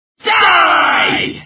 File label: die; cave